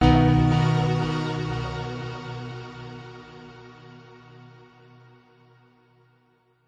multimedia musical logo branding signature short simple warm chords